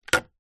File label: household picture frame set down on table